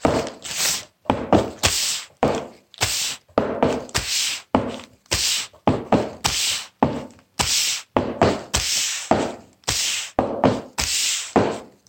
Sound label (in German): Papiermusik